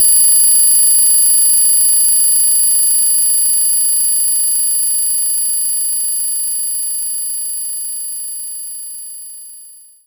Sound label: Triangle